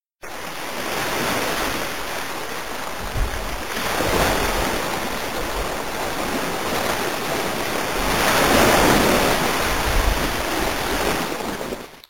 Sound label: ocean